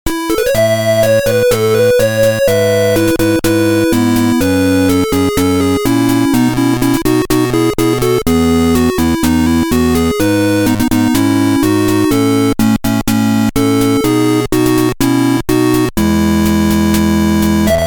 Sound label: Victory